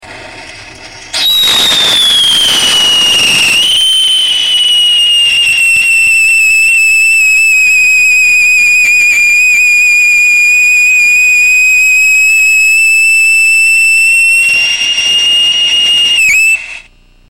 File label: plain with mineral oil and core, rockets, whistle tests; No Catalyst +3 Mineral Oil; Sodium Salicylate